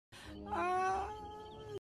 wheezer moans

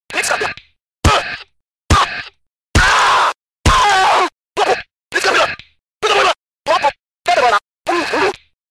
noises

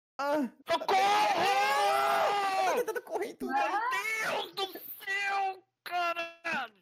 rage

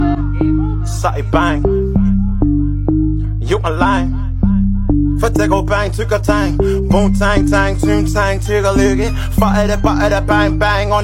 boom tang tang

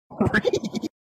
goofy ahh laugh; Quandale

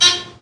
short buzz

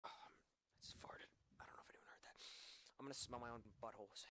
fart; Fart Soundboard

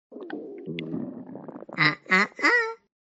ah ah ahh; talking tom

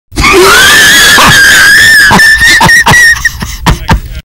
soldier wheezing ear rape

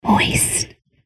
glitter asmr moist

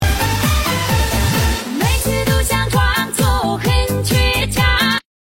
remix; flop tok

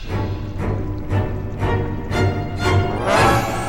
Scary Music; Booba

